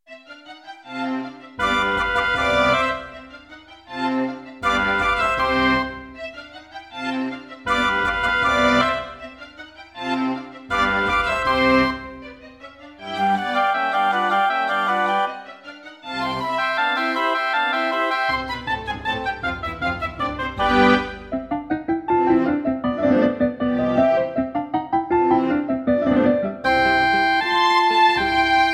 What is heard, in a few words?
Roland SD-90 GM2 MODE; classical; Mozart; Concerto K467 partial